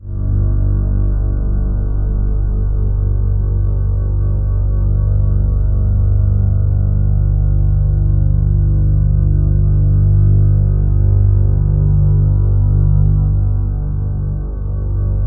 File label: hypnose, low tones, ominous drones; USB Soundscan vol.48; AKAI